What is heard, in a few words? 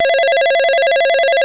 telephone ring